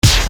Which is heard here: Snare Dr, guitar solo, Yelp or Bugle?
Snare Dr